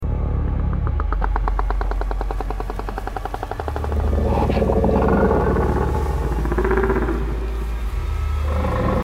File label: Giant Spider Attack; Mother Longlegs; Kong Skull Isl